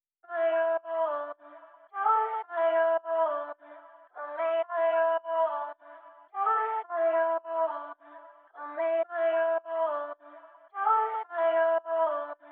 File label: 109 BPM D Min Vocal, Afrobeats; Melodies; Loop Stems; Cymatics; GHOST (Beta Pack); Bembe